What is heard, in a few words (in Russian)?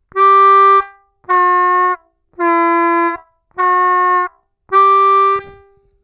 отрывисто, губной гармошки; наработать точность и контроль над промежуточными бендами; 2 -2’-2’' -2’-2